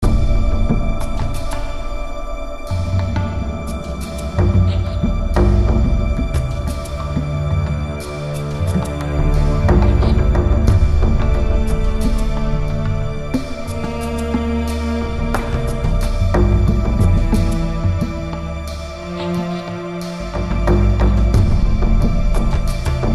Char Creation Standard

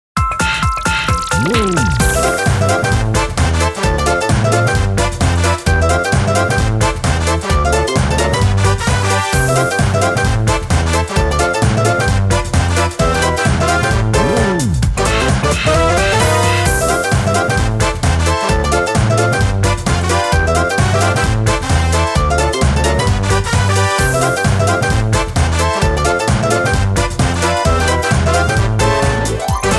Music Menu event

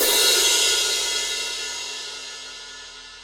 Crash

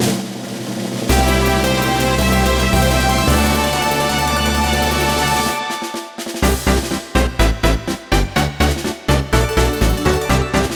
Level Up!; paper mario the thousand year door complete; GameCube version

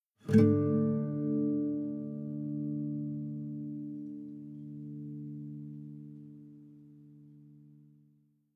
Savannah Music

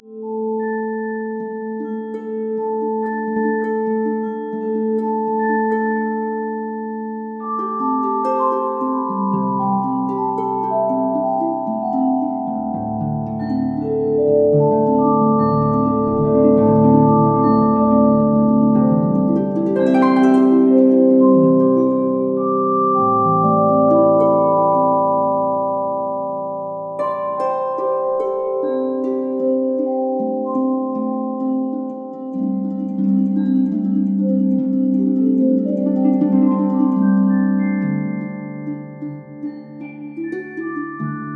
Harp C; Camp D075 Harp C

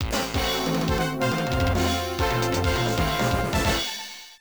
Victory Screen (Classic Arcade Version); super street fighter ii turbo hd remix; xbla 2008; x360 gamerip

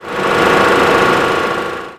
cement truck

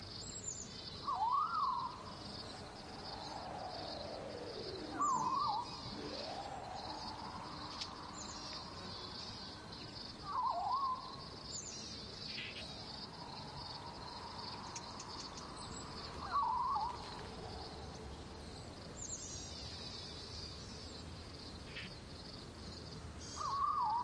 ambience highlands; The Croods

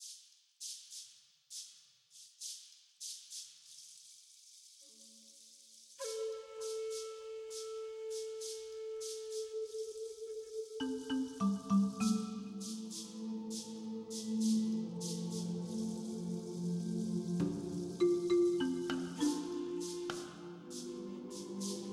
Music Congo ambient

